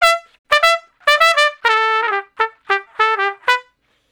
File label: Trumpet Straight (E); Solos